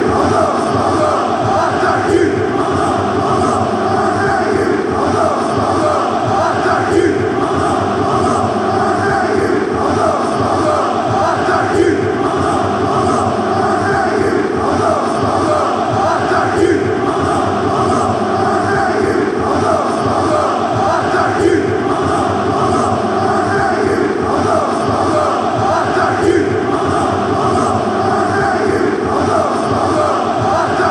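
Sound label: bordeaux bordeaux chant, football songs and soccer chants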